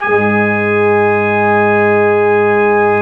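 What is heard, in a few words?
BRS QUINT, brass quintet; Roland L-CDX-03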